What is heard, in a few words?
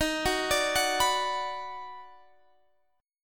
D sharp Minor Major 7th Sharp 5th; arpeggiated